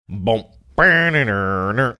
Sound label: singing signature riff